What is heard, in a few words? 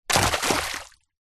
wood stick large throw into puddle water splash; foley